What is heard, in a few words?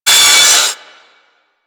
Metal Impact, Weapons; Warframe; Tenno; Miter; Hit Effects